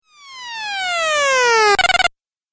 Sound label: Rising Video Game